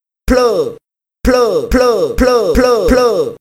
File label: fail sound; osu skins